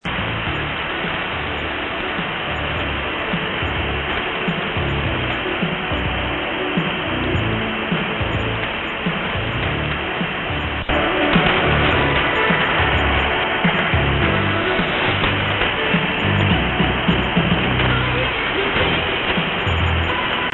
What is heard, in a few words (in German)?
5834.6khz Pirat